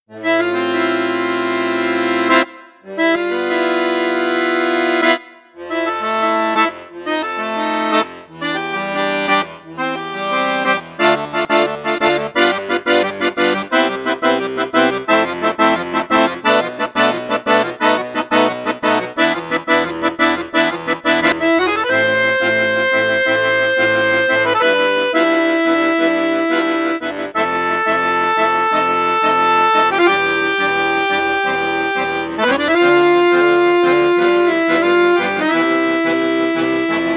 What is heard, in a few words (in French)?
registre 16 8 4, accordeon; bugari 580 ars c